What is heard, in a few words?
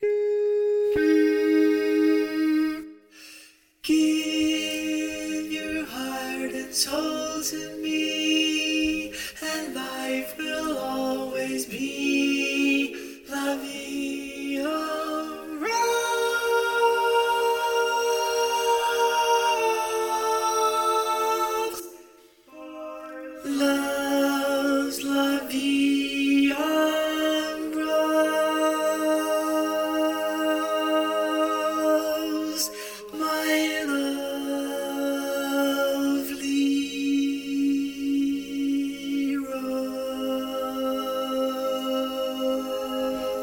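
Baritone, barbershop; learning tracks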